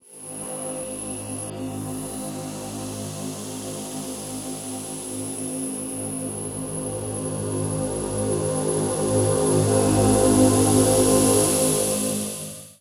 INTERSTELLAR BUZZ DRONE, DEEP ATMOSPHERICS; Sonic Foundry (Sony Creative Software); Vir Unis Infusion

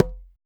TRBCGA HEEL, congas; Drums In Your FACE!

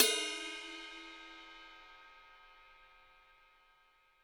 HiHat & Crash, Roland TD-20; HiHats

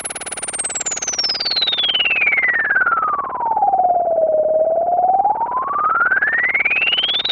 rattle sweep; Zero-G Ambient 1; RATTLESWEEPS

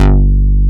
MOOG, Source Bass

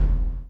CHAIN; Spectrasonics - Backbeat

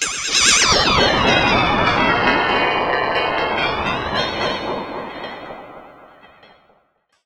Ring Mod & Delay, contact mic Cymbal; musicradar; contact mic madness

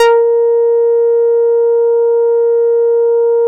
A#5, Bass; PERISITE; E-MU Producer Series Vol. 6 – Analogia Project (CD 1); Boom da Bass 1

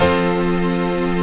CHRD PAD; Best Service; XX Large Pads; Sample CDs

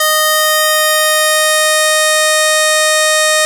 ODYSSEY LEAD Eb5, Vintage Keyboards; Big Fish Audio - First Call Vintage Keyboards

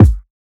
beat kick

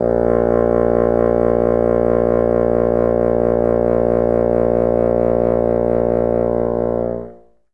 Bassoon A#2; Best Service ProSamples vol.51 - Classic Orchestra 2

